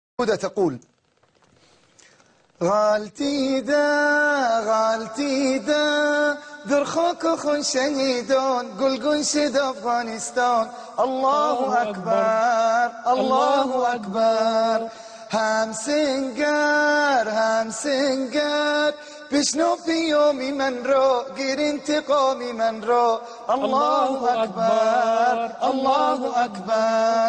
nesid; afganca; afgan farscasi

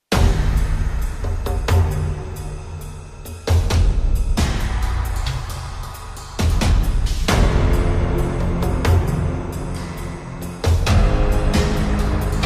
gerilim aksiyon muzigi

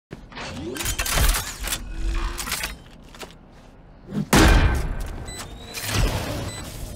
spike kurma sesi efekti; valorant

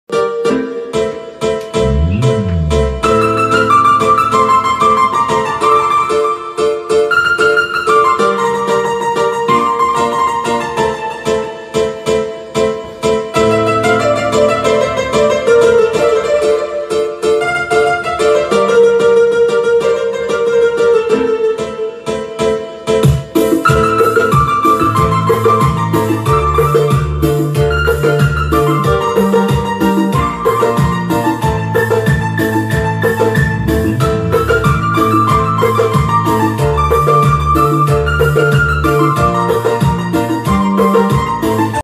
duygusal zil sesi telefon zil sesleri

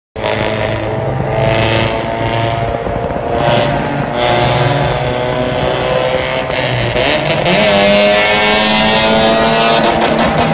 honda civic 1 6 ies tunel duz boru varex kesici sesi

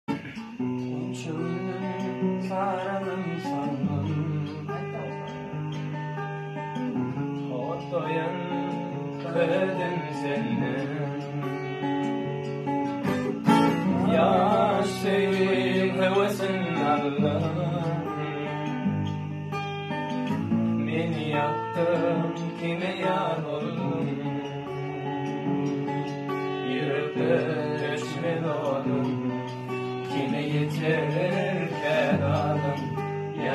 gitara

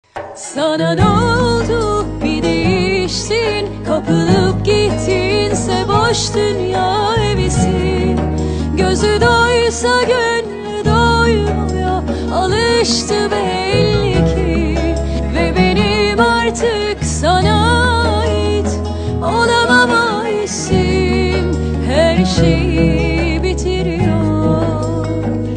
akustik

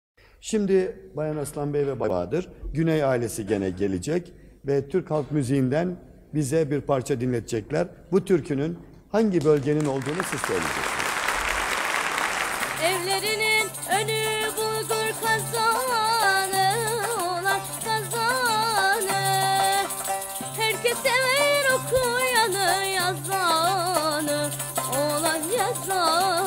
türküsü; trtarsiv; evlerinin onu bulgur kazani

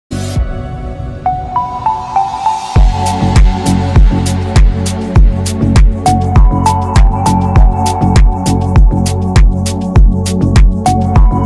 telefon zil sesleri

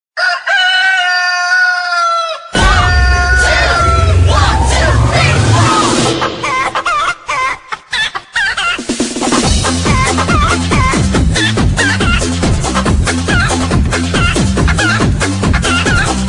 horoz sesi; komik vidiyo

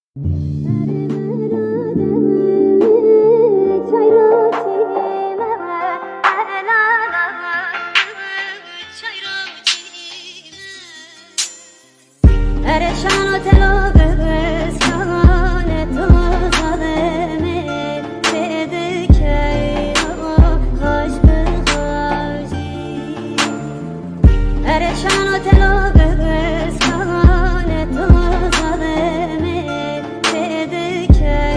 kürtçe trap müzik telefon zil sesleri

